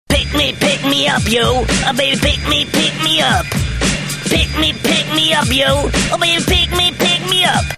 Yeeh

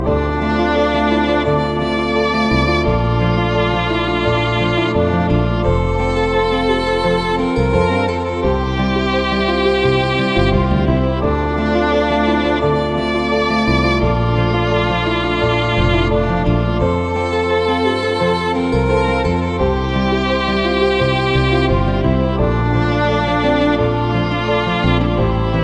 Sad Violin

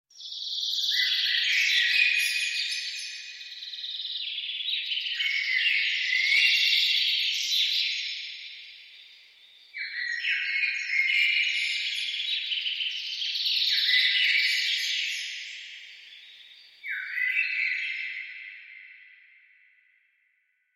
birds

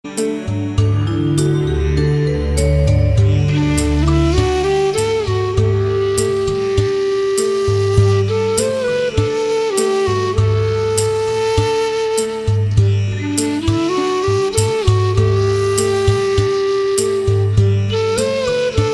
Calm Dreams

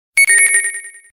beep

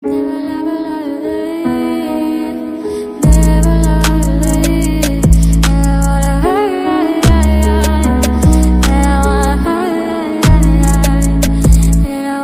sub music

